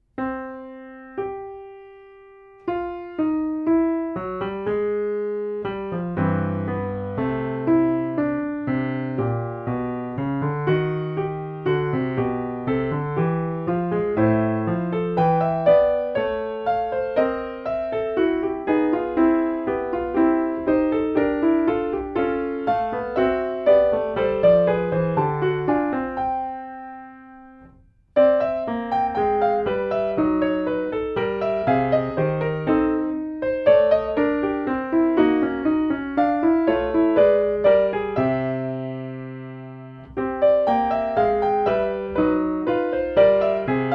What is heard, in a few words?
Fugue